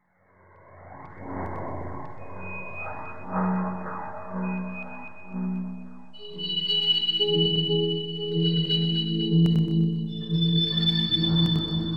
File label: C Phrygian 60, Deconstruction